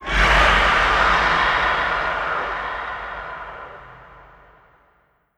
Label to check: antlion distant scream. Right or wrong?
Right